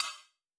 slider minus up; netent

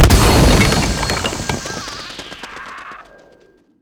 big win loop end; netent

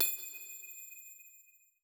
star Hits Target; netent

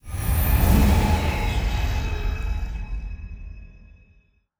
free Spin Continue; netent

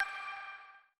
Counter End; fruitblaze; netent

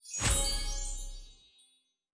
win Sparkle Present Small; netent

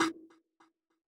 Quick Stop Click Snd; netent

